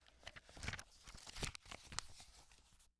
Household Book Hard Cover Book Open Book Turn To Page